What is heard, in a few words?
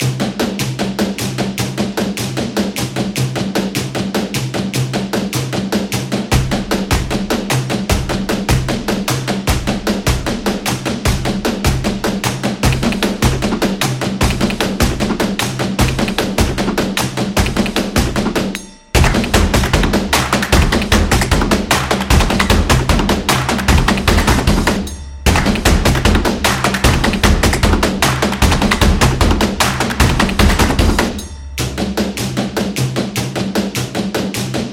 Percussion Upbeat